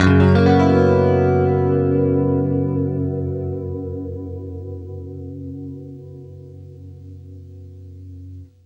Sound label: FLESH CHORDS, Guitar; East West; Steve Stevens Guitar Samples Collection